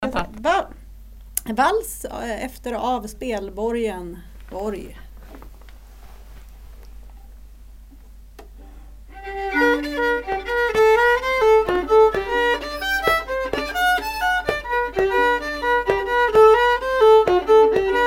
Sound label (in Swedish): Vals; Spelborgen Borg